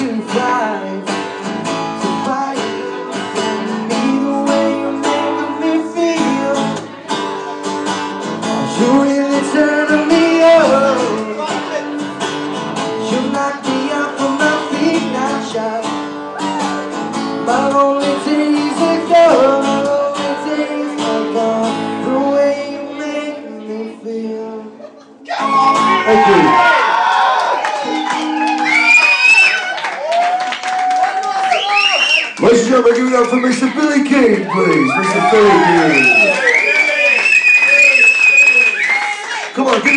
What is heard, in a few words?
great night in the green man with on guitar and great crowd fantastic